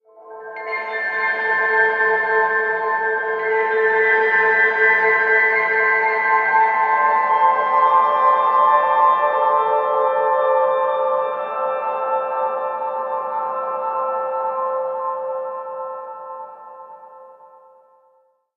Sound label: Map Piano; No Man's Sky